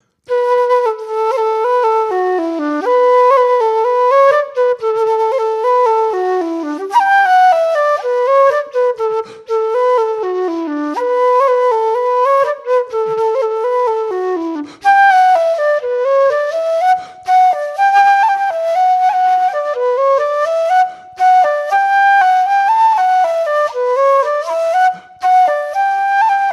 Slower; Wind Shakes Barley

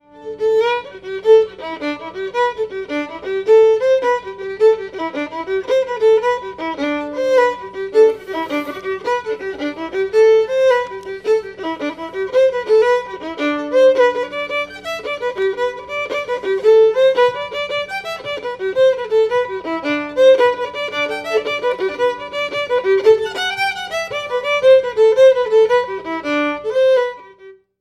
Swaggering Jig med

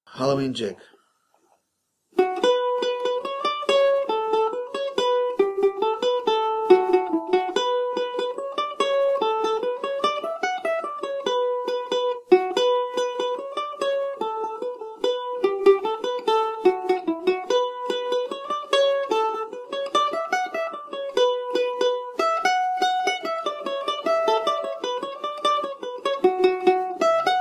Halloween Jig med

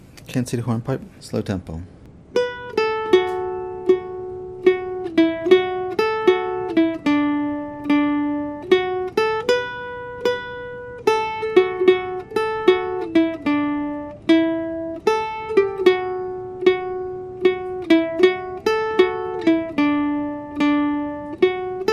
Hornpipe slow tempo

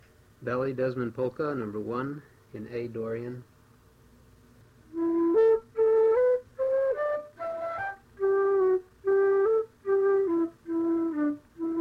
Ballydesmond Polka slow

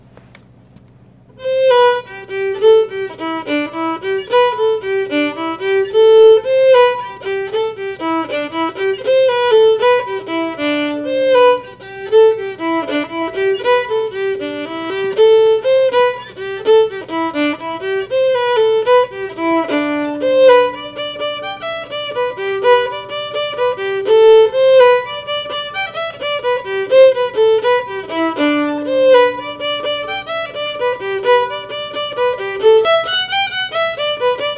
Swaggering Jig slow

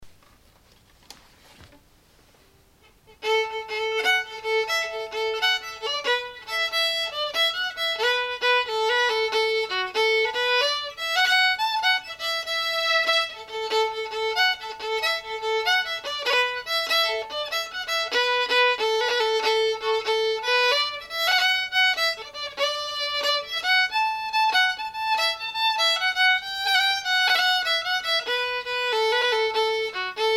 Calliope House

